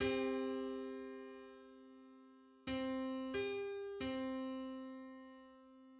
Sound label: 3125th harmonic on C